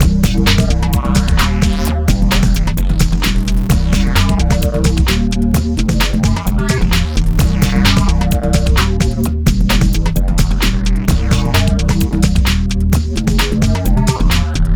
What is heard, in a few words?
Hardcore Breakbeat, 130 Bbmin; Big Fish Audio; Hardcore Breakbeat Action